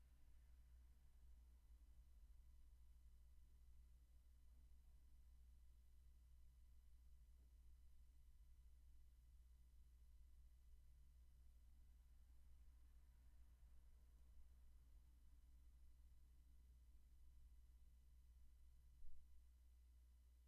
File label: room tone